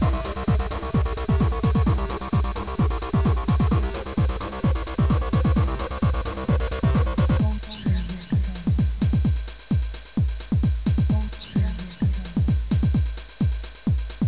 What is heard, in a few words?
theme; Ultra Vixen 2; SLIDES